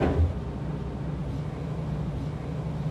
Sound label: gear hydro loop, aircraft; DCS